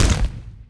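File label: pot hit stone medium; objects; Star Wars Obi-Wan; XBOX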